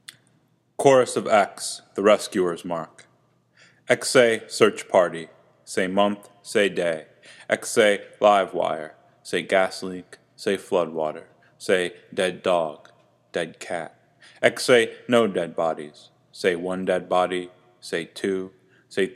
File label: Chorus